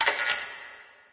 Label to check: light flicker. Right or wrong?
Right